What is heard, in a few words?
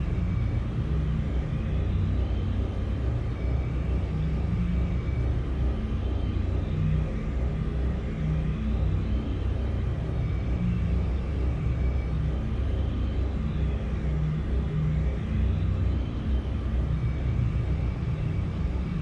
ship idle; ships; skrand; Stellaris; paragon